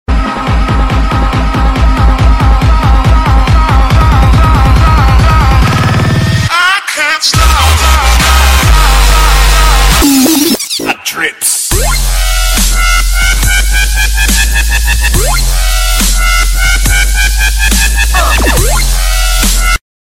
Coolest Dubstep

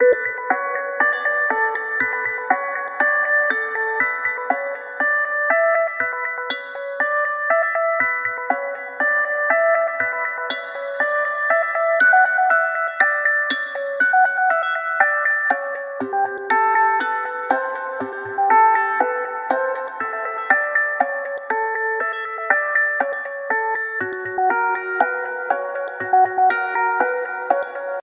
Electric Tone

